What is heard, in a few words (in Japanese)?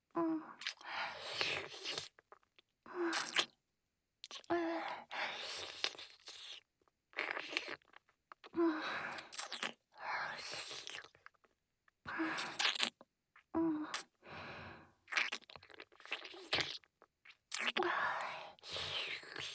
フェラ(口交)